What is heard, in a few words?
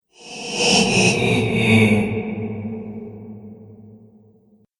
Possessed Ghost Spirit; Sinister, Scary, Spooky Or Just Silly; Haunted House Or Graveyard; Andrew Fleming